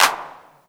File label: CLAP, DRUMS; USB Soundscan vol.02; Underground Hip Hop; AKAI